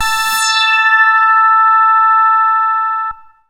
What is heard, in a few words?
MASS LEAD, SYNTH; Keith Emerson Signature Series